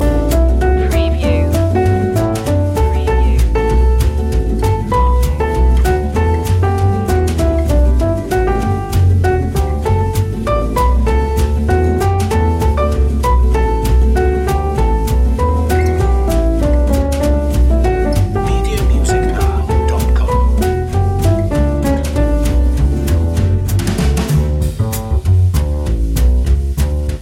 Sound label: here we come a-wassailing jazzy long loop